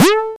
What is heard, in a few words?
techno; E-Lab; X Static Goldmine 1